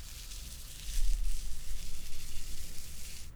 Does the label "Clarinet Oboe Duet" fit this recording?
No